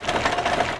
Swinger Loop; Area51; Playable Tutorial Level; TR3

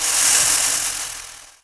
Seal Mutant Fire; Playable Tutorial Level; TR3

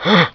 Grunt; TR3; Playable Tutorial Level

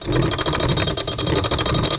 Chaindoor Up; Playable Tutorial Level; TR1

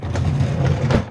Train Door Open; Playable Tutorial Level

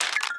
Skel Trap Part 1; Playable Tutorial Level; TR3

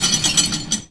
Pulley; Lara; Playable Tutorial Level

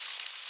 Pole Slide Loop; Lara; Playable Tutorial Level

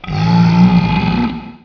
Warrior Growl; Playable Tutorial Level; TR2

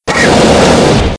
Crane; Playable Tutorial Level

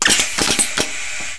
Mapper Open; Playable Tutorial Level

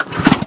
Vehicle Snowmobile Start; Playable Tutorial Level; TR2

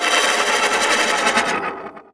Puzzle Spin; Senet; Playable Tutorial Level

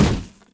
Box Hit; Object; Playable Tutorial Level

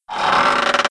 Stair Banister Bend; Playable Tutorial Level

